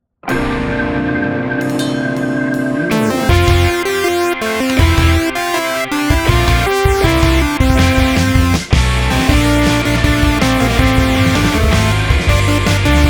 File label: Key Synth Melo long